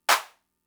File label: Clap; Fricke MFB-522